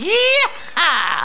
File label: yeeeeahaaaaa; yeehaha